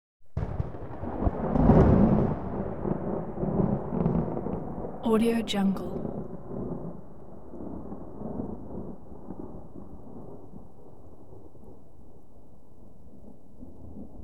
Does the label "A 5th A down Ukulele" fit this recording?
No